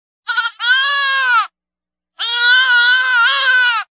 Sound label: Kid Goat Distress